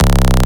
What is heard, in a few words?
Bass Shot B; Mutekki Media; Vengeance Electroshock Vol.2